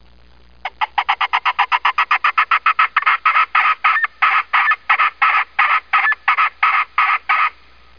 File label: pheasant